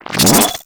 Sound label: Freeze Ray Shot Stop; Warframe; Weapons; Corpus